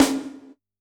Snr, drum

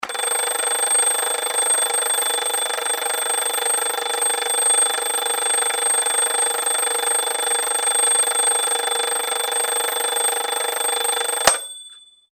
CLOCK

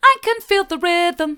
i can feel the rhythm hard, vocal

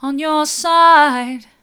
mid, vocal

